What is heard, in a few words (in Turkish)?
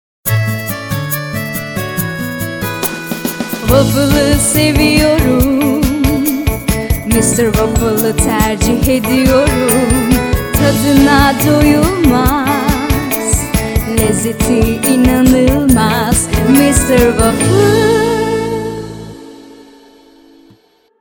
vokal, Jingle Advertising; BiberSA Production